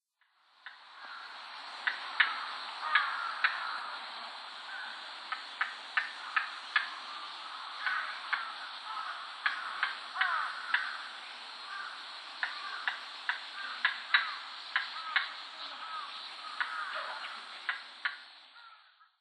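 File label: Striated Heron; ササゴイ; 巣立ちビナ; 栃木県宇都宮; 平野敏明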